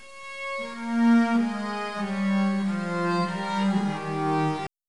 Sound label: drama, csound